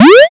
jump

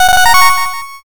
fanfare

